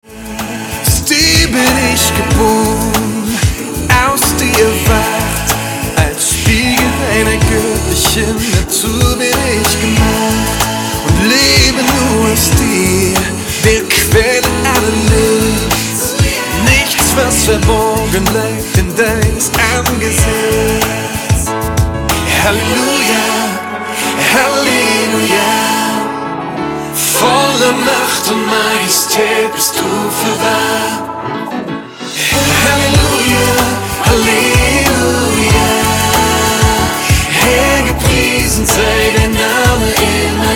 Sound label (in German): Halleluja